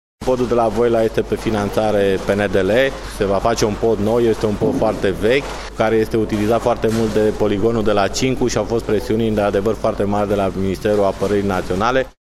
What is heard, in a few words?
DRUM; Tara Fagarasului